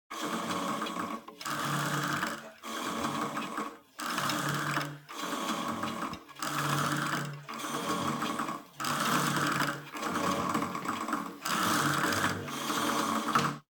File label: Adrenaline Gym Pulling And Releasing an Old Cable Machine; BOOM Library